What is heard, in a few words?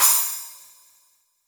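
Splash cymbal, Boss DR660; drum machine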